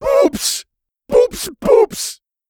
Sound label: boops